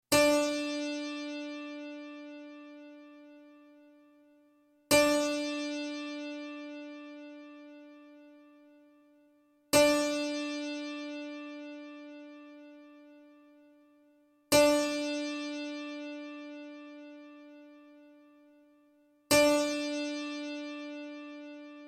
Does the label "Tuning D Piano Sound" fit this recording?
Yes